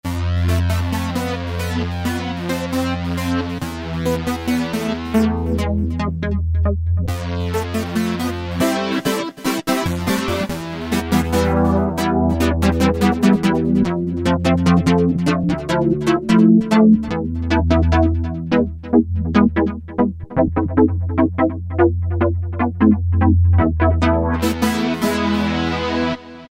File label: Korg microKORG Detuned Comp; Factory Voices